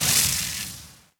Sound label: Electricity